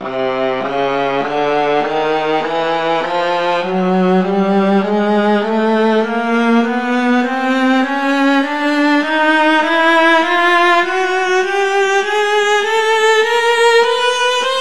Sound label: Chromatic Scale; Scales; Vla